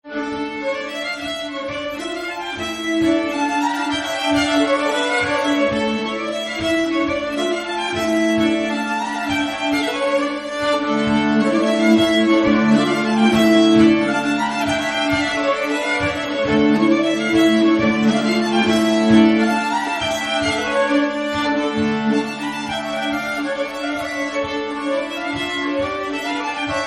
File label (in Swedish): polska; bolstad